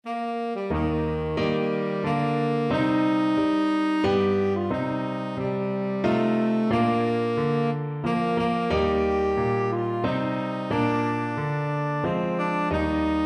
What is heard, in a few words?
tenor saxophone